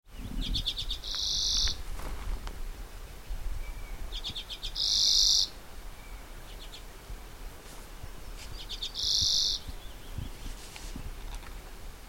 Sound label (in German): birkenzeisig